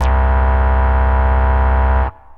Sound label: Dual Tone Vinyl Synths C1, bass